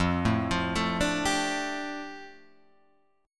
F 6th Add 9th F, chord; F6add9 Chord {1 0 3 2 3 3} arpeggiated

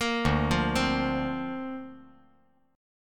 D-Minor 7th Sharp 5th D, chord

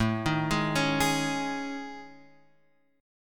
A Major 7th Flat 5th, Guitar, Open F; AM7b5 arpeggiated; x,4,3,3,4,3; JGuitar

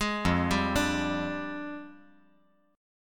F Minor 6th Add 9th F down

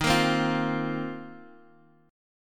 A sharp Suspended 2nd Suspended 4th D sharp

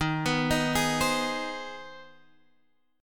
C Minor Major 7th Sharp 5th, D sharp, chord

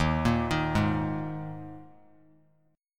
D sharp Suspended 4th; D#sus4 arpeggiated; JGuitar

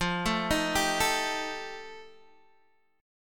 F 9th F Guitar Standard, chord; arpeggiated; JGuitar

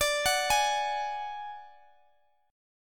D Major Flat 5th D Guitar Open D, chord

